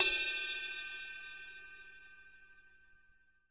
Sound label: CRASH BELL, KITS MEDIUM; 90s sample CD